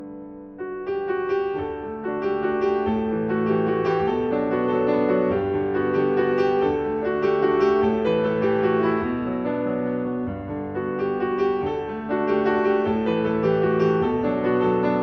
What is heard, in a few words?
high piano music